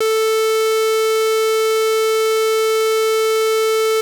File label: square, 808, SubOsc